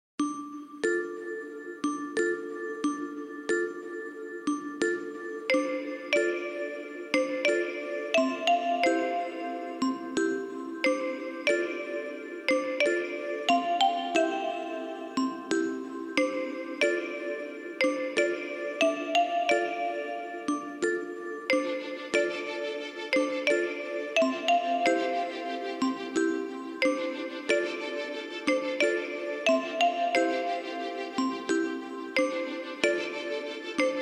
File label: Music game children